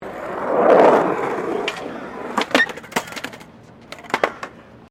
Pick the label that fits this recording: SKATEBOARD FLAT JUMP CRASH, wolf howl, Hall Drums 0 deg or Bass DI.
SKATEBOARD FLAT JUMP CRASH